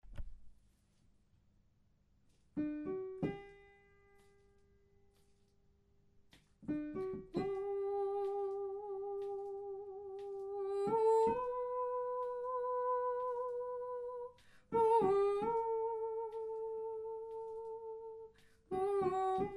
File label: soprano